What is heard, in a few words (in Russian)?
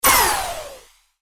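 рикошет снаряда из лазерного оружия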